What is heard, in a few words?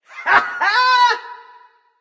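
non verbal yay, voice; cernunnos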